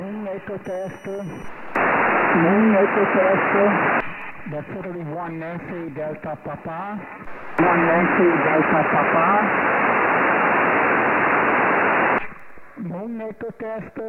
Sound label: echo ssb